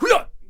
Hwacha Attack Vocals

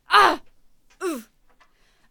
Bomb Thrower Death Vocals

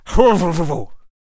Gunslinger Attack Vocals

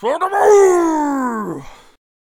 King Attack Vocals

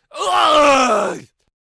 Peasant Death Vocals

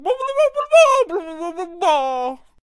Potionseller Attack Vocals

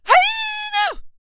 Shouter Attack Vocals

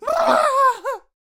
Poacher Death Vocals

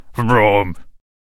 Miner Attack Vocals

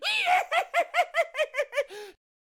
Mad Mechanic Attack Vocals

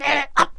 Halfling Attack Vocals

